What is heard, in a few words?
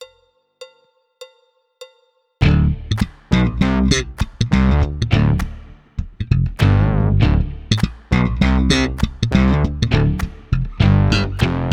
Powerful Funk drumless